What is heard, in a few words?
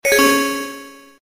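blackberry message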